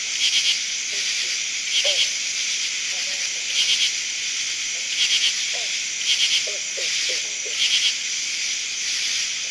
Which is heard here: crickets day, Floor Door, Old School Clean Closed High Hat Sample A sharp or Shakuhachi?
crickets day